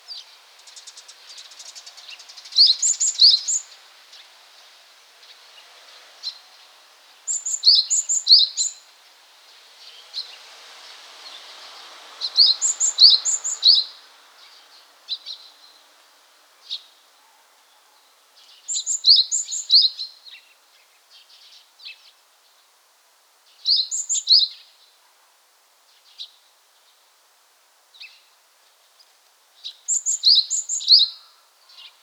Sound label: Parus varius